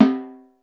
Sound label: timpani soft, Yamaha Motif ES drum machine; 300 Drum Machines; Yamaha Motif ES-Series